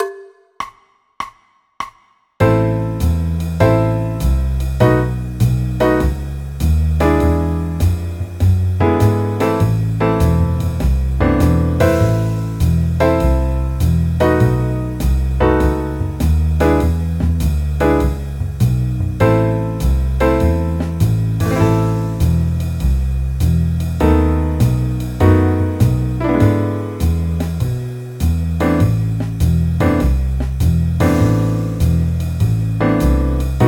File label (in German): a moll akkordverbindungen